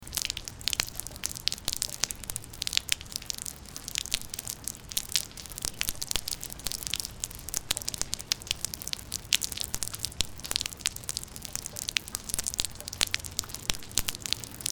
Water Drips Raining

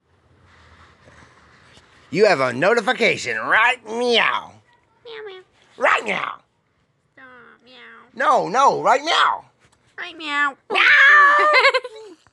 Notifacation

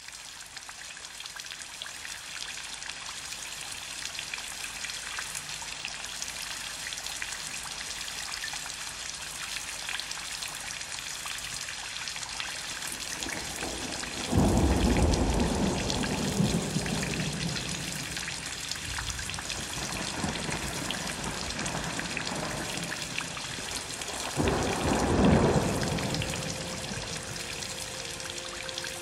rain sound

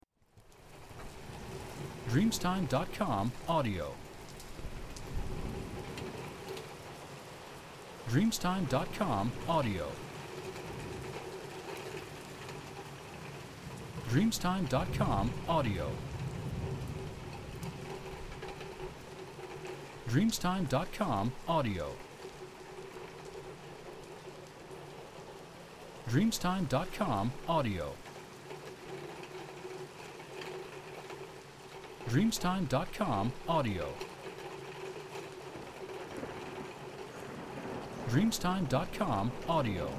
Rain with thunder in autumn

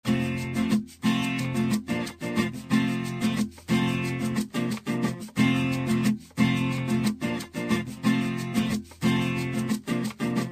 Latest Guitar

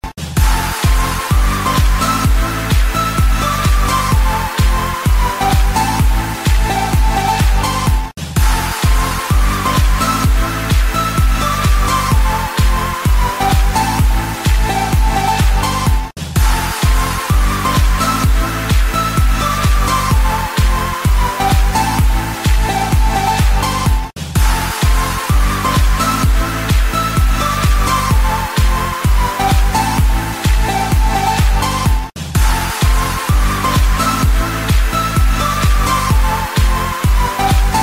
iphone edm

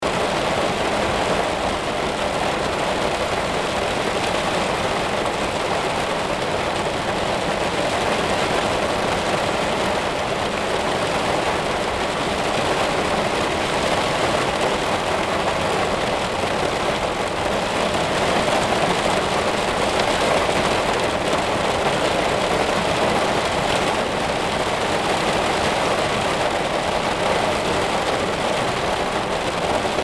Rain Against The Window

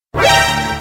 short sms; metal gear

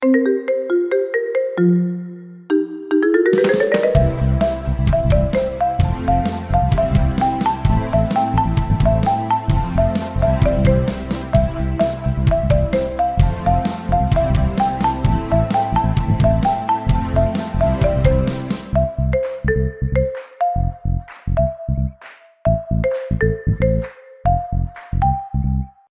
iPhone XS

